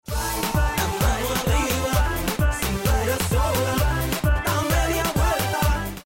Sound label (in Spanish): danza